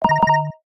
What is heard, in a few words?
Pulse Beam, notifications; Samsung Galaxy S23